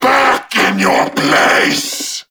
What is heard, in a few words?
Vocal Attack; Warframe; Duviri; Dax